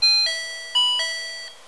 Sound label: Ats, train; nankai6000; OpenBVE